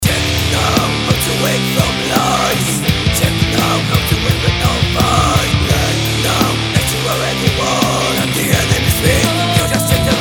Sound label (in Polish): dzwonek